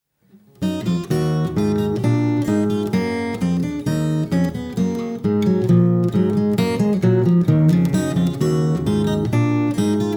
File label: Lute Suite No.1 in E minor Bourree